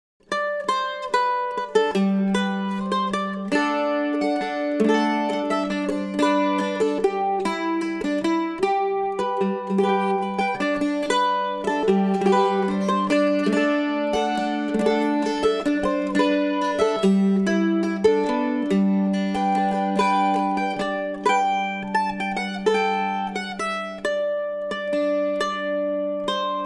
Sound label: mandolin